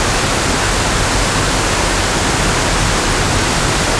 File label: Waterfall, ambience